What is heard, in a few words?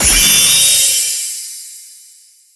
Enemy Tower Charge Complete